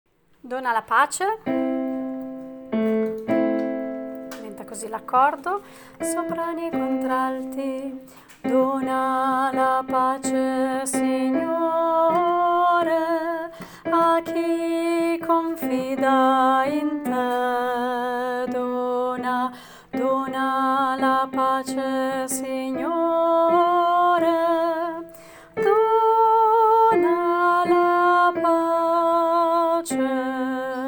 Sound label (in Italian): dona la pace contralti